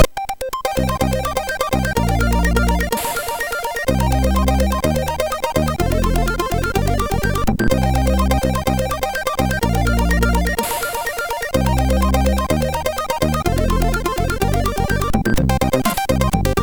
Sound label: Intro Remix, MOS6581R4